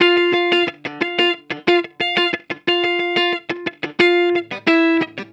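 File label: Hip Hop Guitar G major; Digidesign; Pro Tools; Pro Tools Essential Loops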